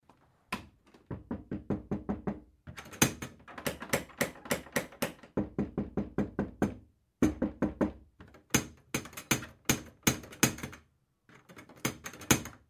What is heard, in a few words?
knocking